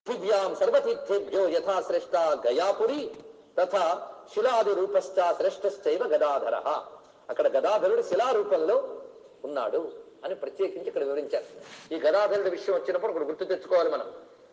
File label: gaya smarana